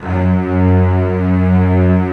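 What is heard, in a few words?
cello F#1; Digital Sound Factory Virtuoso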